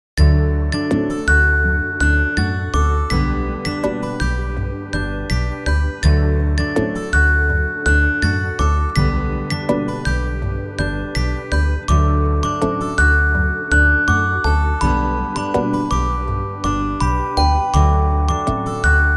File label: Christmas Music